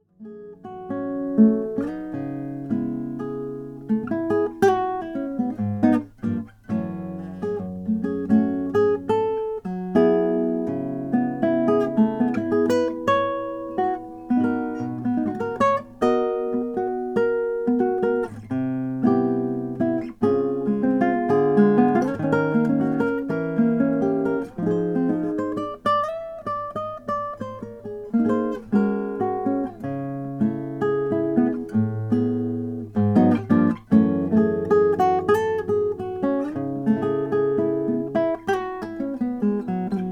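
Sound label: cervantes crossover concert cedar top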